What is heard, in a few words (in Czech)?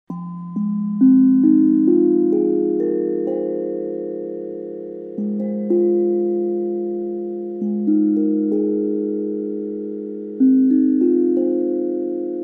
Sundrum 8-tónový, moll, F-Gis-C-Dis-f-gis-ais-c